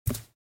Socks single step on concrete

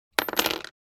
Dropping a coin on a table

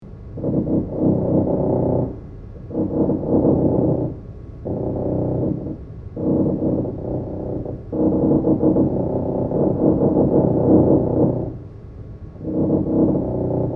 House renovation ambience hammering and drilling

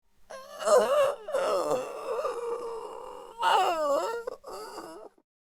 Werewolf pain scream male

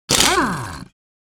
Impact wrench single burst

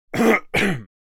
Male throat clearing

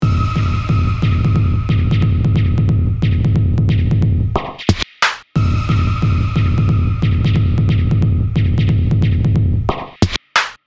E-Drum loop

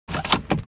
Blind lock mechanism locking; blinds; gfxsounds

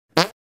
Fart quick quiet ripple

